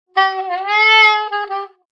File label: Sax Complain, A saxophone complains; Funny Musical; Sound Effects:Musical